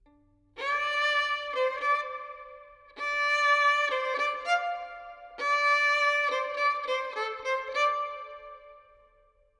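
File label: Verb, Violin, 4-4, D minor, 100 bpm; Melody; Sample Banks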